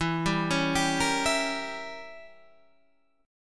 E Diminished 7th Guitar Standard; Edim7 arpeggiated; JGuitar

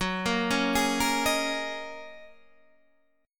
F sharp 6th Add 9th F sharp Guitar Standard; arpeggiated; JGuitar

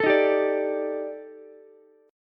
Eb Major 7th Flat 5th, Ukulele; EbM7b5; strummed; JGuitar

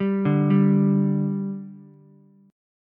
G 5th G Ukulele, chord; G5 arpeggiated

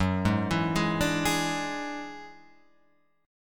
Gb 7th Suspended 2nd Sharp 5th, Guitar, Open F; arpeggiated; JGuitar

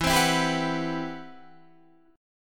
C Major Sharp 11th E

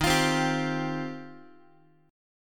D sharp 6th Add 9th, Guitar; strummed; JGuitar

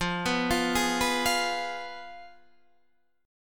F Diminished Guitar Open D; 15,14,15,14,14,15; down; chord shape

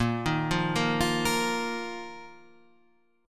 A sharp Suspended 4th; arpeggiated; A#sus4; JGuitar

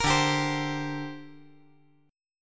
D 7th Suspended 4th Sharp 5th down, chord

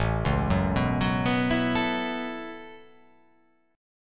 A 6th Add 9th, A6add9 chord; arpeggiated

